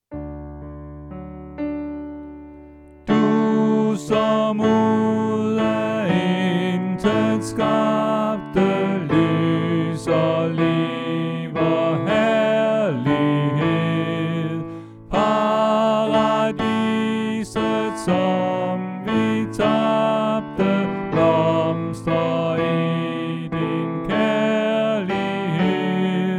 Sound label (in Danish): Tenor; oevefiler